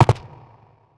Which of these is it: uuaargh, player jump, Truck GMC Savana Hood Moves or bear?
player jump